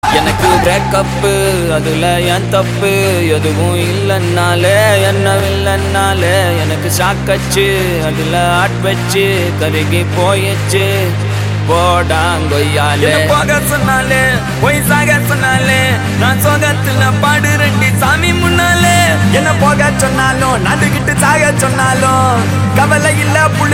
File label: Breakup Song Theme Ringtone